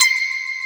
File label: BLEEP, HOUSE; WORS STATION